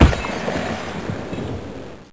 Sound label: Putty Shatter; Saban